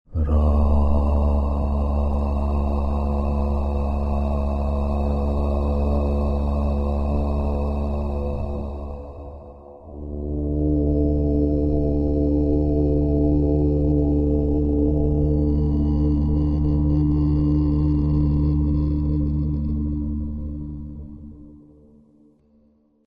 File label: mantra ra hum